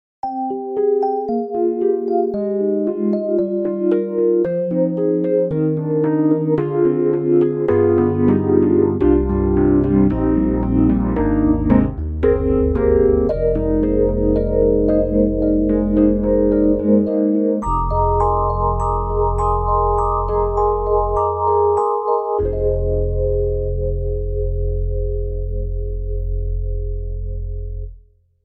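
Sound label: Stage electric piano Phase; IK Multimedia; SampleTank3; Stage EP Phase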